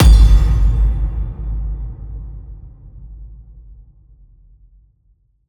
Impact, Hit, Chime, Resonant Hit, Chime Accent, Tinkle, Fast; Airborne Sound; Crisis Accents; Sound Design